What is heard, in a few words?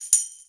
Tambourine Off